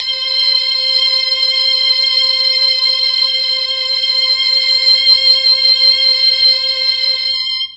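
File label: C4 strings brass, Mellotron; Mike Pinder - Mellotron Archive CD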